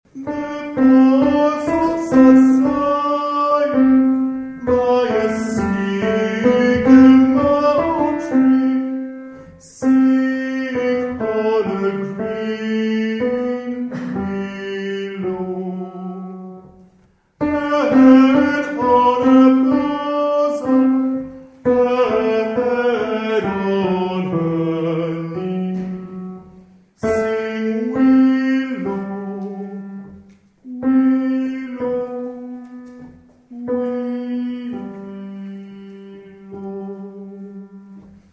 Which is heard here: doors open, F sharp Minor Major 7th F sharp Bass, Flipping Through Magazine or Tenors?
Tenors